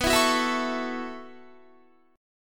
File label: B 9th Suspended 4th B down Guitar; strummed